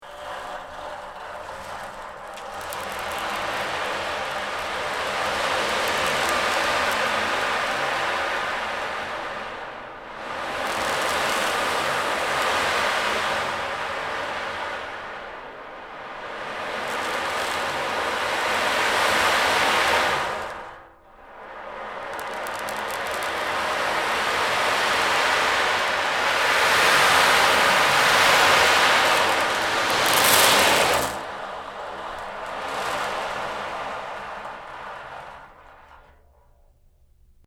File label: Dragonfly Rain